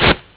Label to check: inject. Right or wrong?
Right